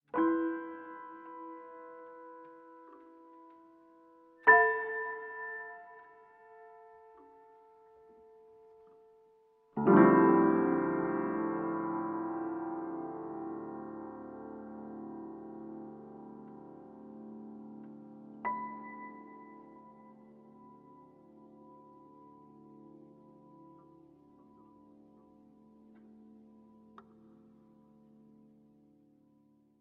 tune

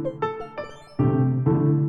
Shopping jingle; onisep

